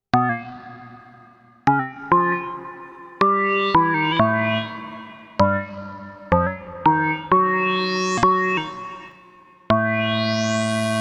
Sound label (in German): Korg NTS 1 Hall